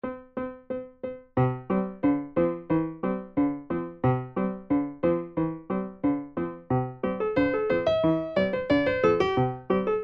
Sailboat 180 beide

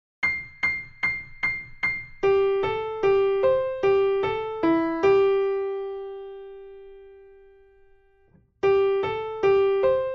Boogie T150 RH; Klavierschulen; Tastenkrokodil

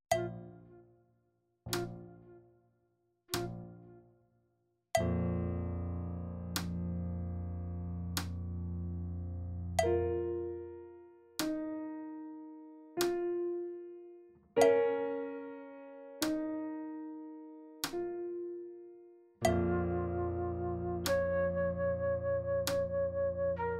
Piano Flute Slow; Uebungs-CDs; Playbacks

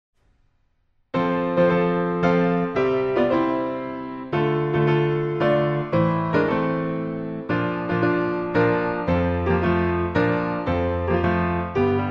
Mazurka F Dur; Chopin; op 68

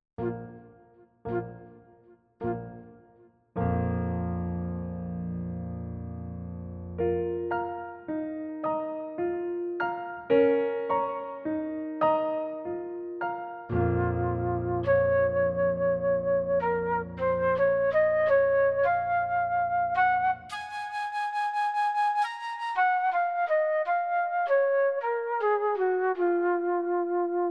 medium stereo; Uebungs-CDs; Playbacks

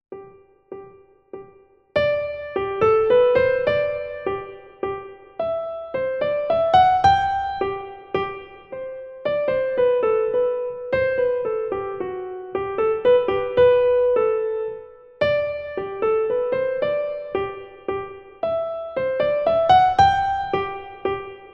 Menuet G RH mittel; Bach Johann Sebastian; Notenbuechlein Fuer Anna Magdalena

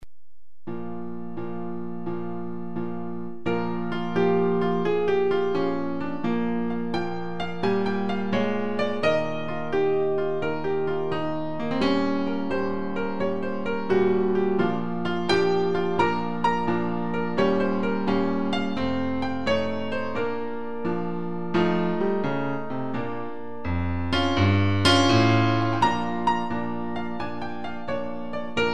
Blues 86

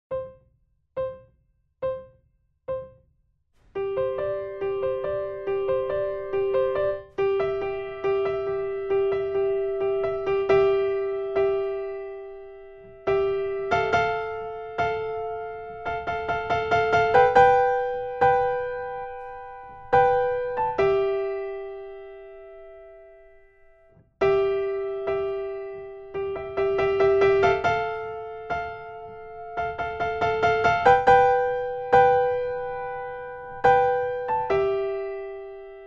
T70 RH

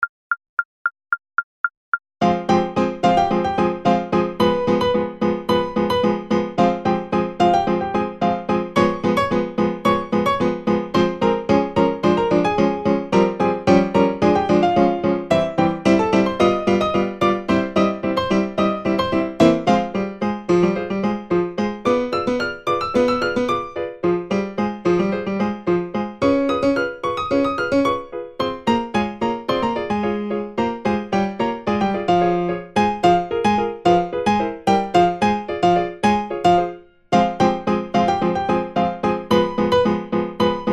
Charleston 110 Primo Secondo; Seiber

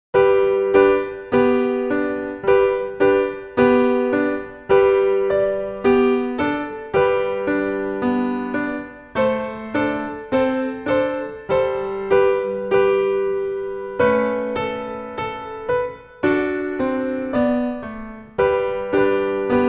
Jingle Bells

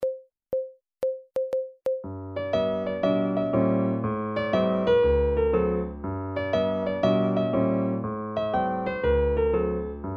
Slow Fox 120 beide; Seiber

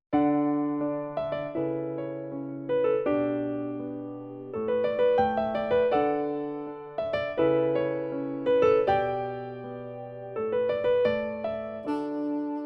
Piano Sax; Jazz; Playbacks

